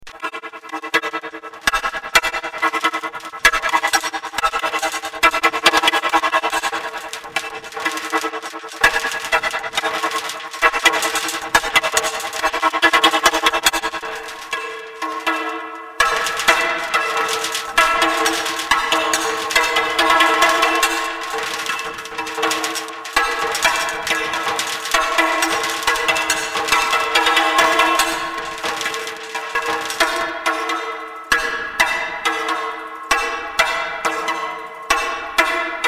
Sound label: looping drum